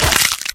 damage, player

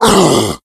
hulk pain; Left 4 Dead; Source Engine; tank

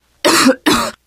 cough, teengirl; source engine; left 4 dead 2

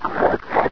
zombie idle; hl1; Source engine

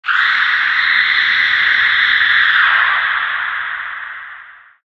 female scream, halloween; Source Engine; tf

